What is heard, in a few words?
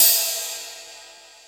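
R8 ride; X-Static Goldmine 1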